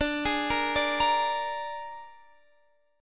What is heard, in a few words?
Suspended 2nd Flat 5th; x,17,18,15,15,18; Gsharp